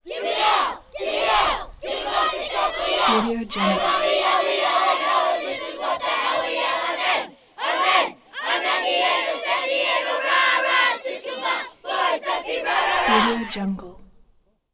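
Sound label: effects exterior camp cheer; frost valley ymca; collanderson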